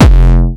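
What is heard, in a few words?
bass drum distorted; 300 Drum Machines; Yamaha Motif ES-Series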